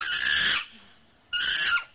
sonido simio chango

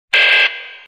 pregunta incorrecta

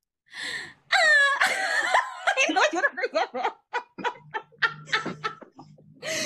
dulce tortuga

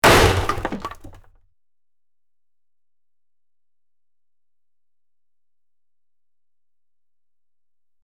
madera rompiendose

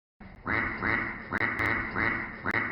cuak cuak

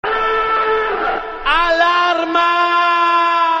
alarmaaaaaa

